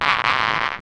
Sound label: the sound of a rubber